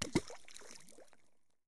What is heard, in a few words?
water under, footsteps